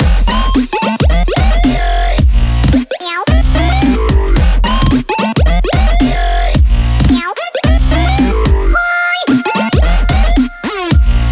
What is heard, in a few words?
Glitch Hop Remix, Loop; Undertale